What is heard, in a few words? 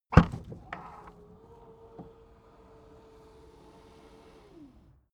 car trunk open automatic